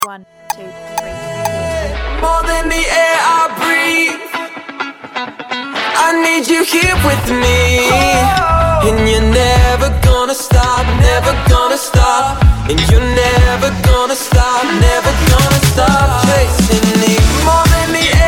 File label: guitar